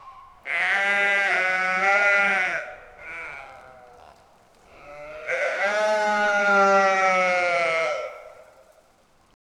sheep bleats normally

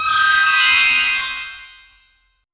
a game sfx, interface

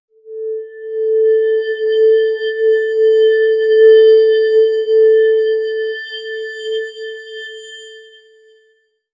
a 5 second hi tech audio, audio logo

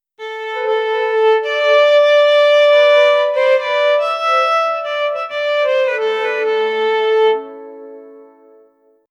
an instrumental

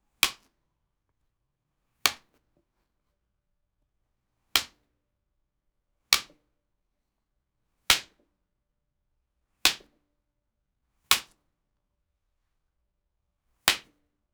rubber band aiming and sh

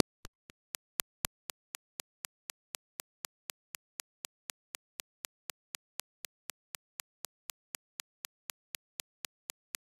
clean ui tap shot; feedback

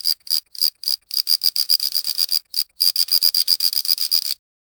black powder flintlock, firearm

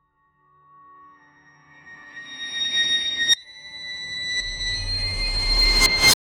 creepy horror music with, reversed piano

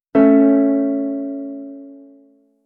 quiet warm system alert, electric piano

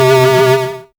recover a heart in a, retro game

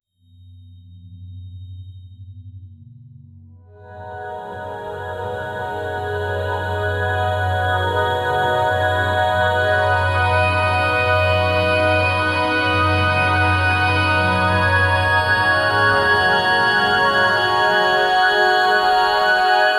a mellow yet bright jazz

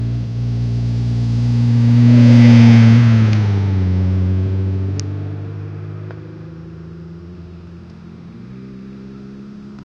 old car passing by rough; shaky

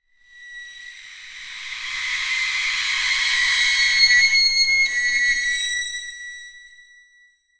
metallic screech

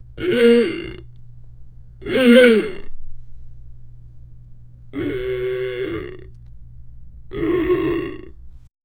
goo character movement

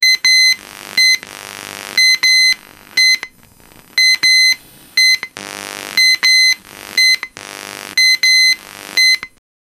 electrical short circuit

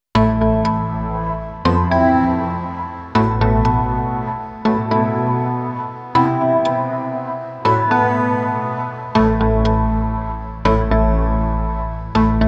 a lite music for backgrou

